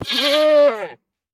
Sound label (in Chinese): 山羊死亡声